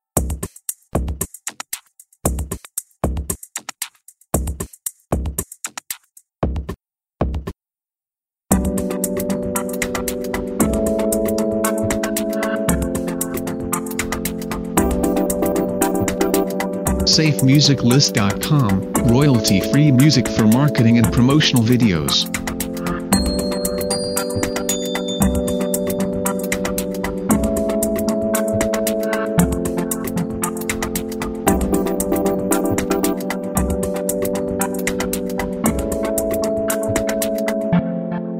Hi Tech Lounge; SafeMusicList

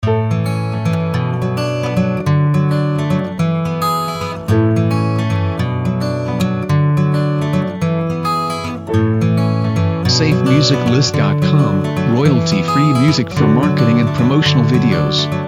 Romantic Acoustic Guitar Loop

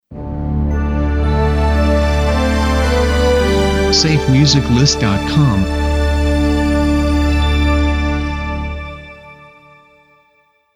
Cinematic Video Intro; SafeMusicList

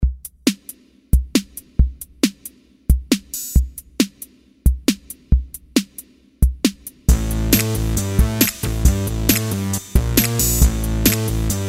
Action Technology; SafeMusicList

